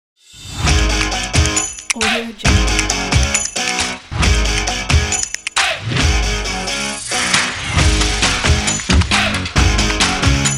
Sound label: sports intro